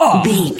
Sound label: voice male hurt pain